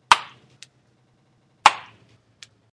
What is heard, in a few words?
bubble gum pop